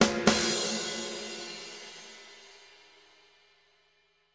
sneaky End

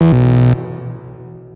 error

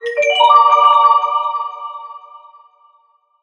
weird Chime

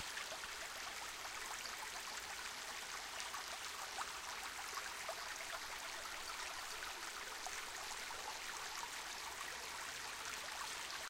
stream

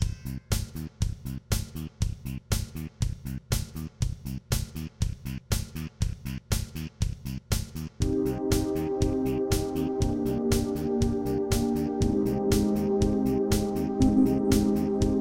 morning run

